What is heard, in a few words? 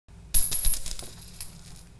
coin